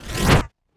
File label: wooshes transform sweetener